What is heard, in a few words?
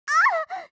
FEMALE damage